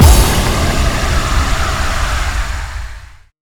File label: FX Boom